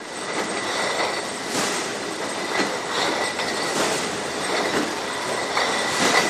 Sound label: Bottling Plant Loop, factory